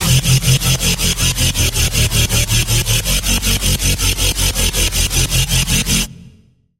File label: Bass, Serum 2; Bass - Hell Turbo; Routed Sound; Serum 2 Expansion - Chaos Bringer Vol.1